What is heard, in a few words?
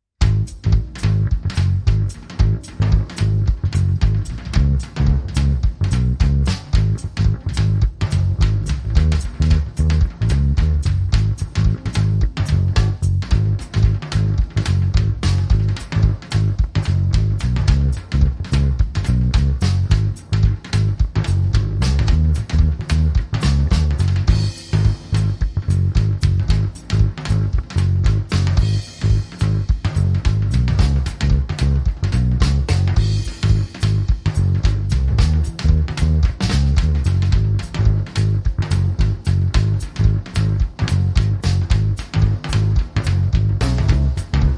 Jive Bass Go Round